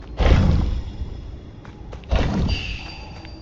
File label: button; kena bridge of spirits